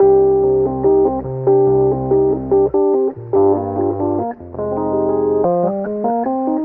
vibrational; Loops; Electronic

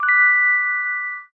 twinkly, Synths

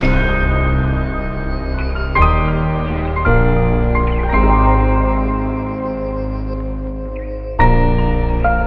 piano, Loops Sad

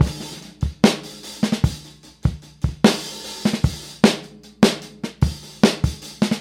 so offbeat it hurts, Funky Drum loops

